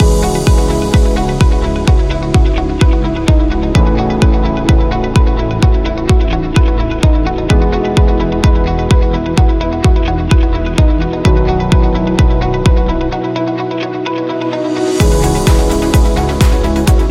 Corporate Loop Motivational

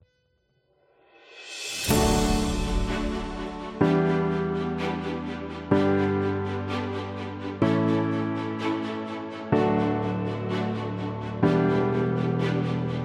Motivation Epic, epic music; epic music vol 3

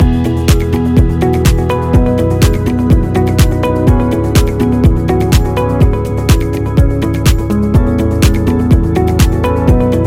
Inspiring Corporate Loop Presentation

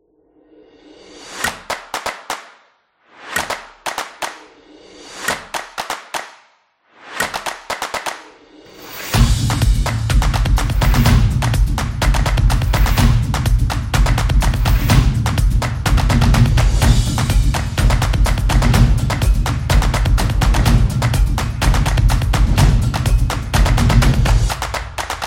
Dance Epic; casual game

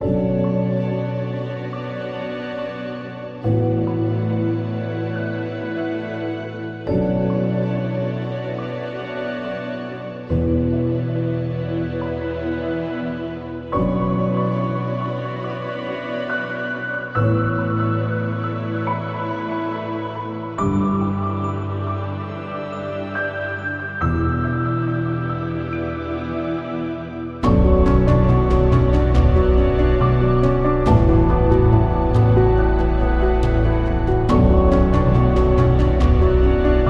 Documentary Ambient

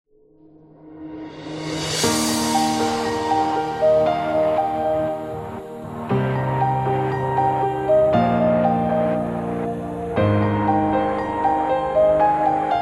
Summer Piano, corporate music; corporate music vol 11

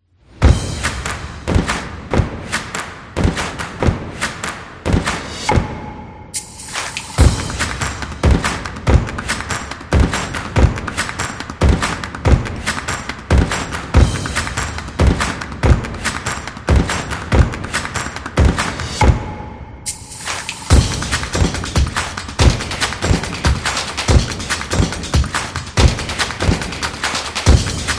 Drums Claps Stomps Snaps Percussion; percussive nation vol 3

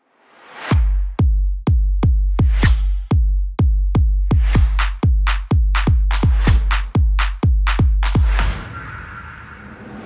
Drum Fun; soccer stadium

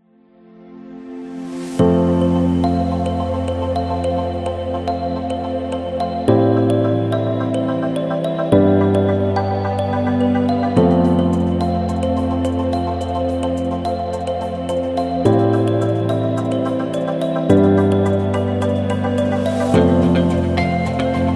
Corporate Slow

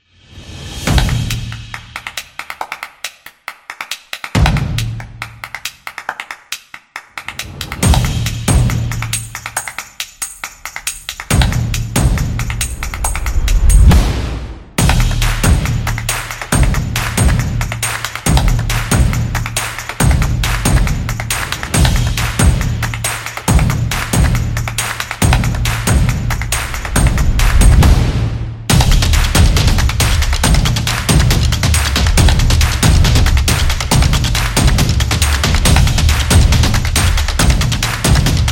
The Percussion

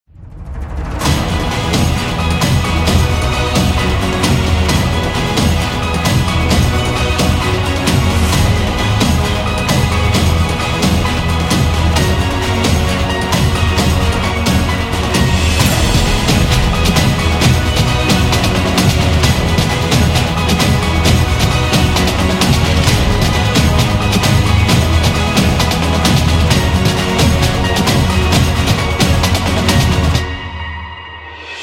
Sports Game, epic music; epic music vol 4

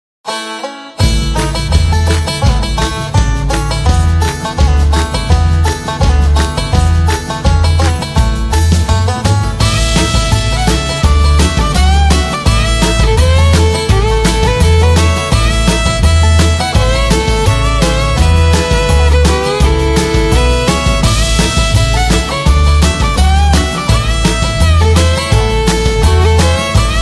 A Country

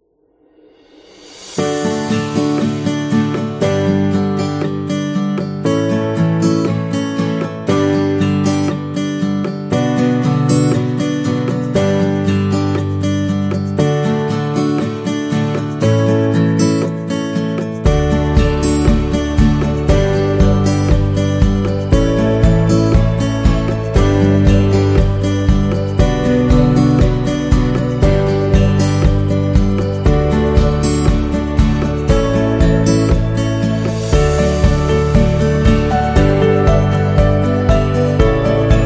Summer Acoustic Guitar; happy music vol 8

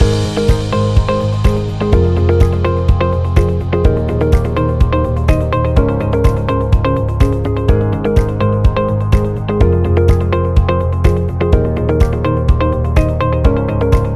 The Corporate Loop Presentation

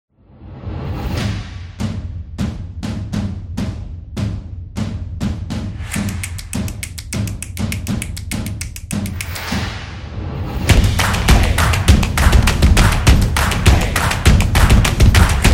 Drum Energy Percussion

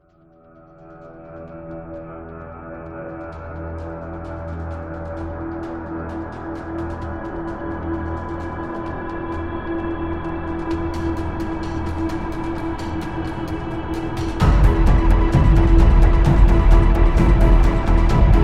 The Suspense; fear

